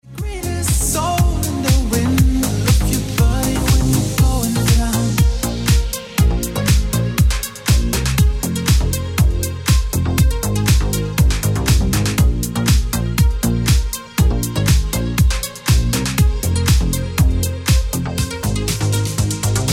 Remix Ringtone

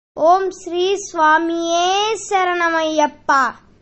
Ayyapa Devotional Sloka

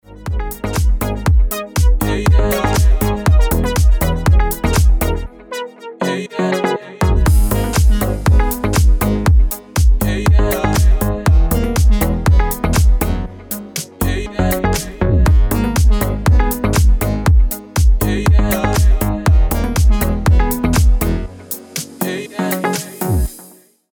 Original Mix Ringtone

